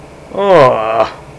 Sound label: uhh, People; Sound Library 2000; Wayzata Technology